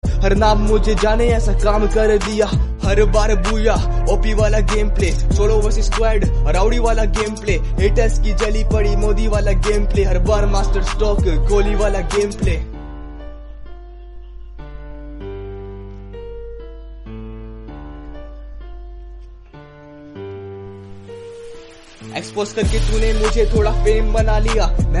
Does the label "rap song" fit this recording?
Yes